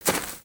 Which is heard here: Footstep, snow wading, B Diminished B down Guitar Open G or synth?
Footstep